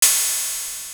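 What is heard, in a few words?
Open Hi Hat; BME Rattlesnake Parametric-Percussion-System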